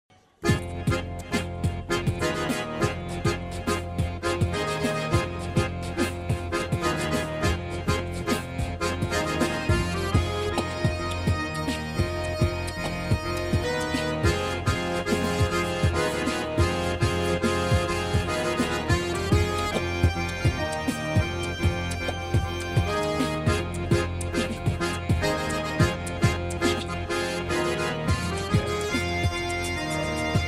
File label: ACCORDEON BEAT